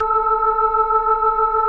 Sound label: A4F, B3, Vintage Keyboards